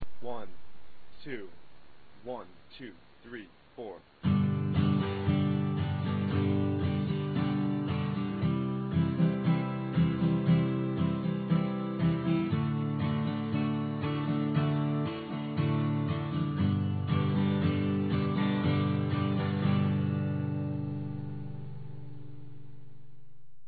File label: strum, guitar acoustic